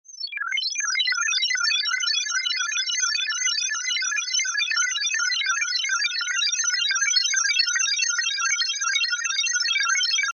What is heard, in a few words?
Pink Noise Effix Delayed; Techno